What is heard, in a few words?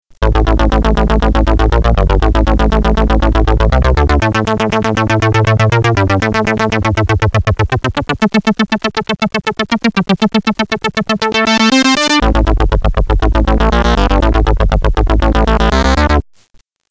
synth